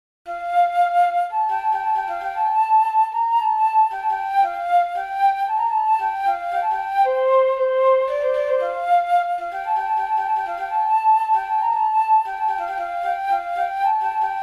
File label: Trills Lovely Flute